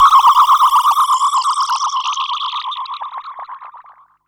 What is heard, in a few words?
tx fx yabber; One Shot FX; Sample Magic; Transmission-X